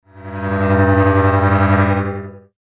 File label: metal scrapes